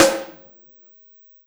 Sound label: Snare Shard; ZeroGravity Drum Shards